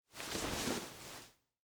Suit Coat Fold, Foley, Clothes, Suit, Coat, Fold, Over, Arm; Dressing